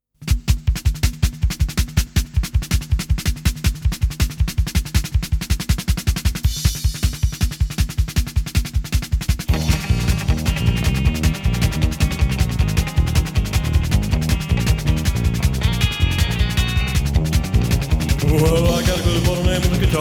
Guitar

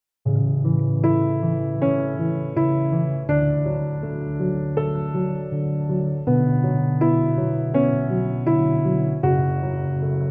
Yoga Piano Music Relaxing Classical Piano Music

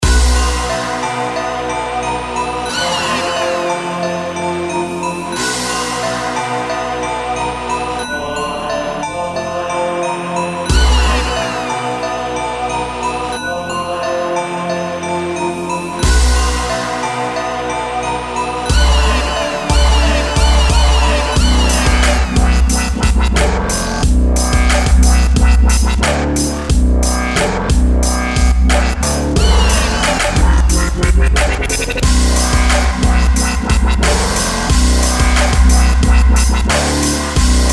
Drum Pad Machine

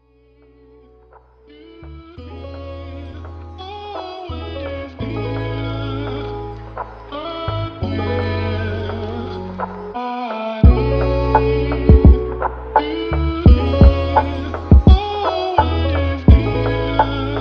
Chill Summer LoFi

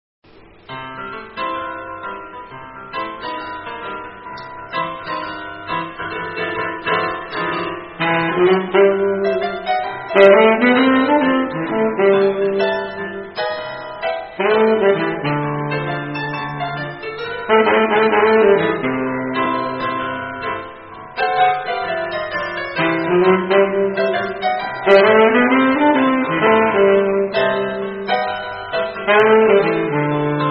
Saksofonist

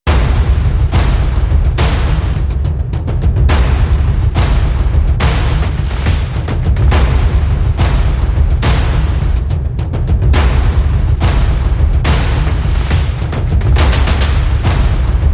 Percussion Bed Instrumental

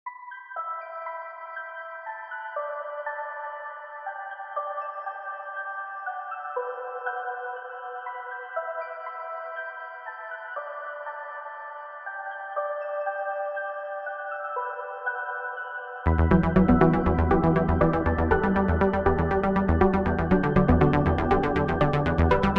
Industrial Symphony

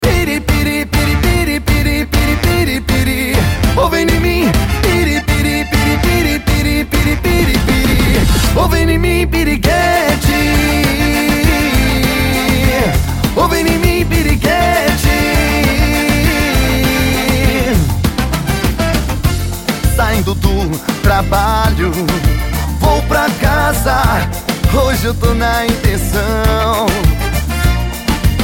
Samba

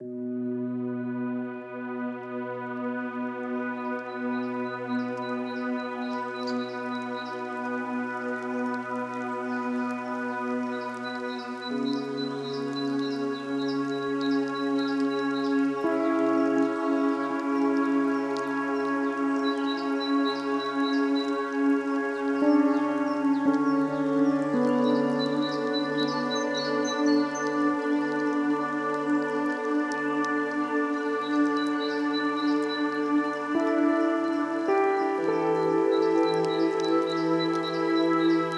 Nature Sounds, Rain Sounds

